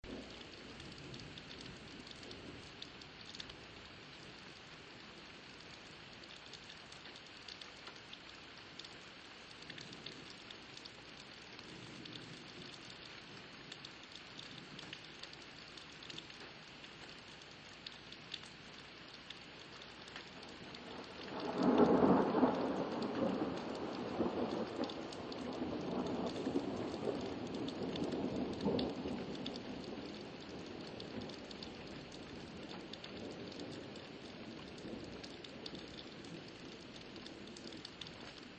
early morning thunderstorm

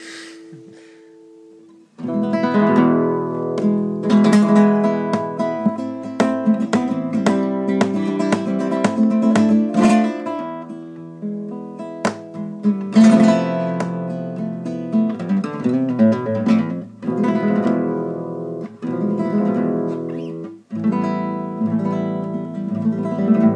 flamenco

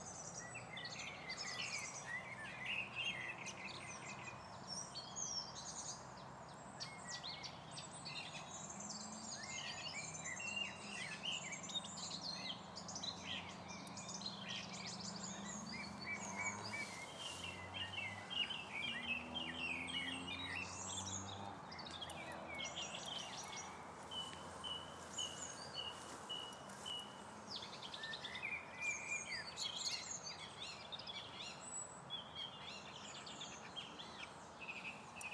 birdsong near the weirs

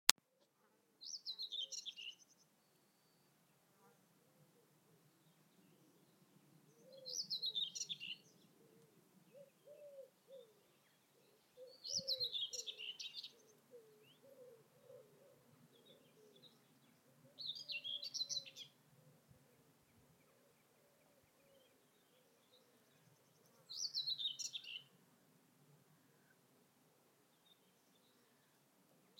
whitethroat song

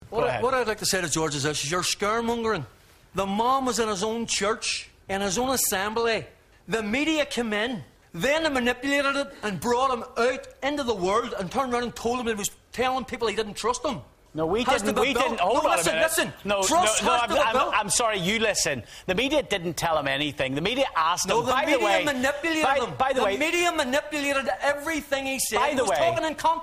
screams; George Galloway; Muslim row

